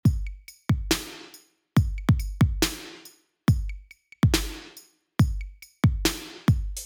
Gated Reverb ON

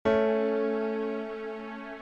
A Bb chords

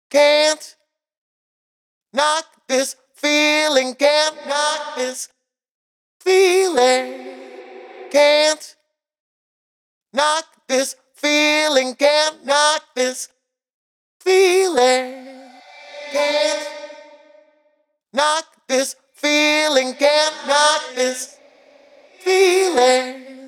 Automated Reverb

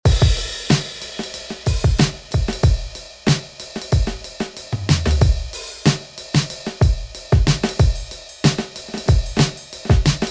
Drum Writing Examples Full Beat

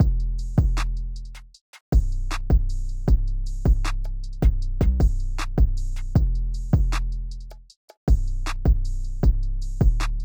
Type Beat Drum

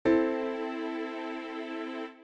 Am 1st Inversion

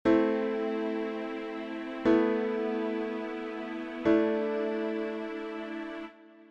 Am Sus Chords

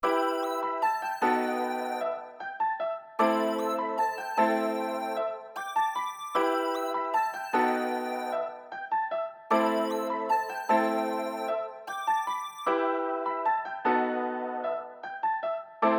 Type Beat Melody Example

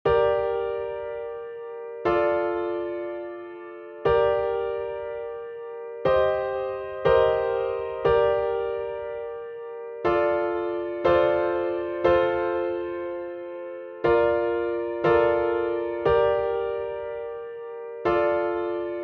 Gm Nice Prog

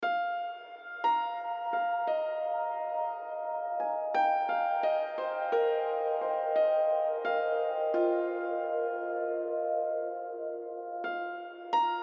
Piano

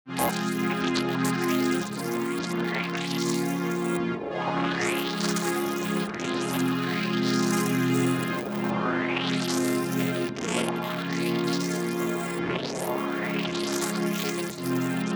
Sound Design Chords FILTERED

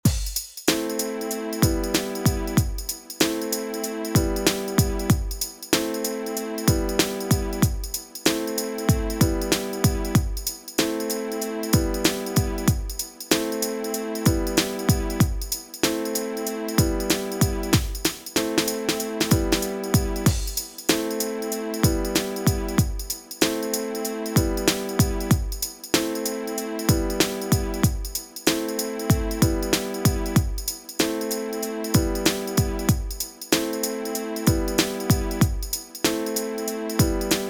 Sidechain Compression Snare to Chords OFF

